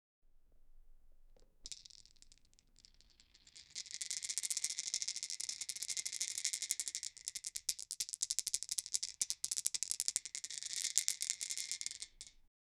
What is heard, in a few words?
rattling dice rolling sound; asmr